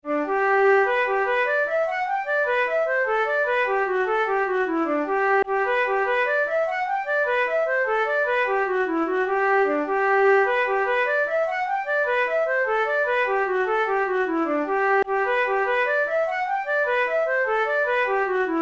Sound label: jig, flute